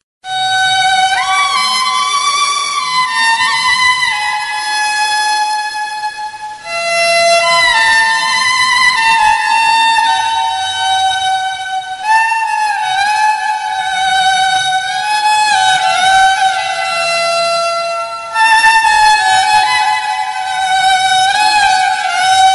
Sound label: ringtone violin; mohabbatein; aishwarya rai; amitabh